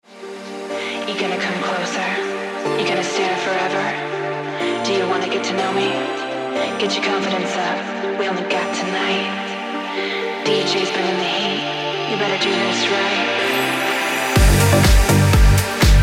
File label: Ringtone Deep House Music